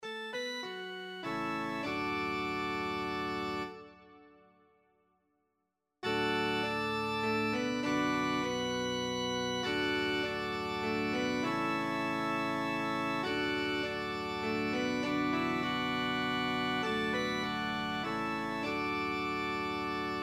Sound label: Psalm organ